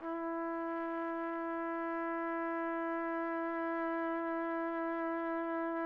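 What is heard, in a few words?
TPT, trumpet; Roland - LA Composer vol. 3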